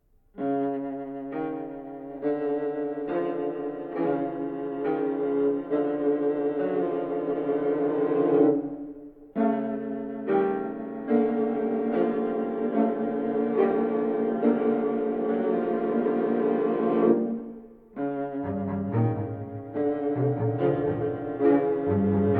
music for violas; zeljenka

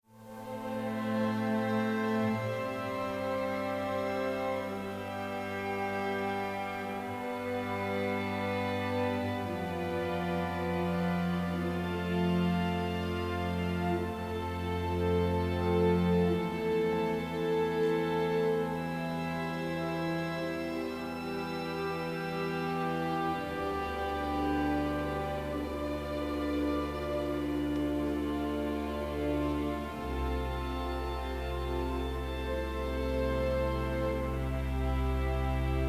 for strings

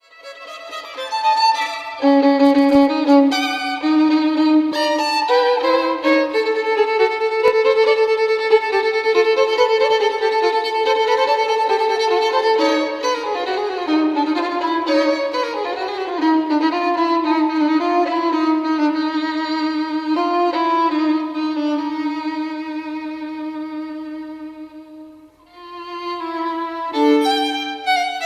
Ciaccona

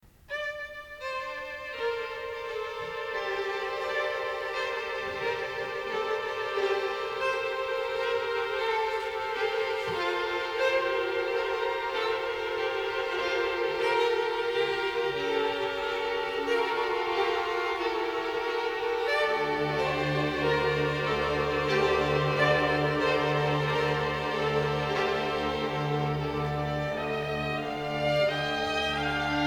chamber concerto for viola

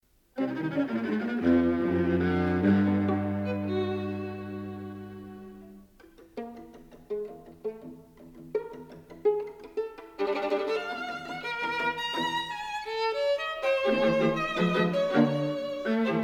string quartet

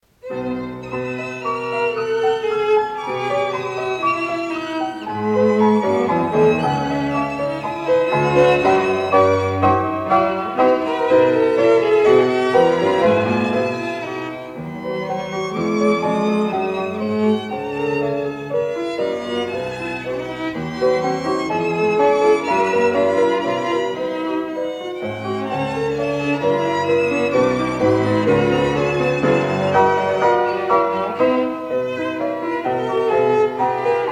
Trio H dur

